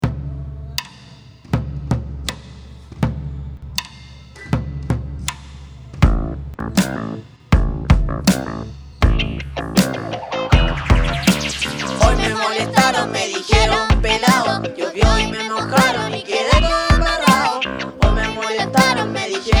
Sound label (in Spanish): CANCION PERCU CANCION